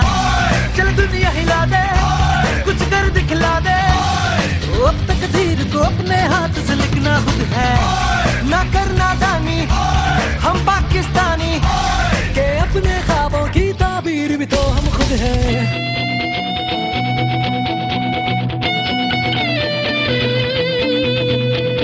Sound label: pakistani song ringtone